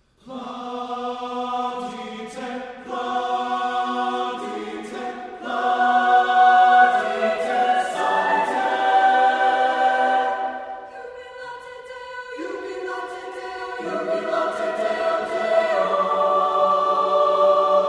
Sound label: choir; Plaudite